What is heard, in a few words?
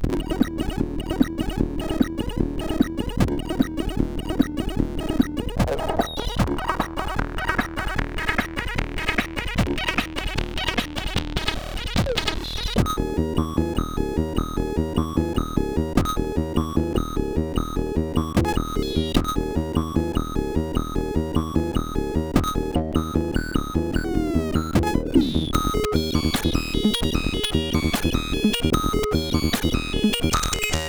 Anthem, sid CSG8580R5; UWillRaise; hvsc